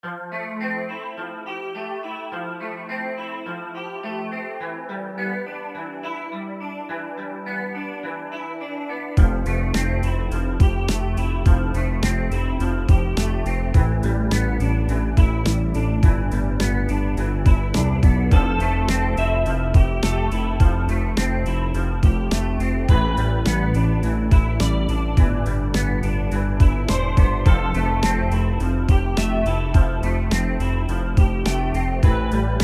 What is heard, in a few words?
DREAMY GUITAR, We've taken a Jazzmaster guitar and added an extra bass layer for more richness.; CINEMATIQUE; KLANG